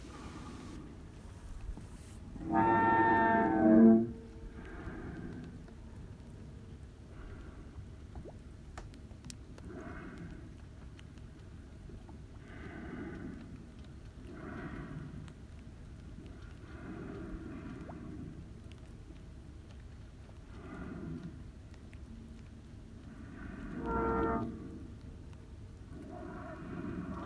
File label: Humpbacks; Kuiu